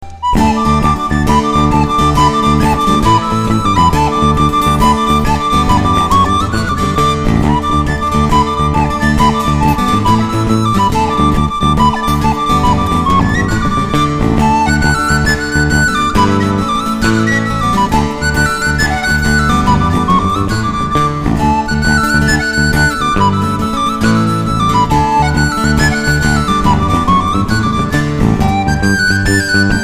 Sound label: Jig of Slurs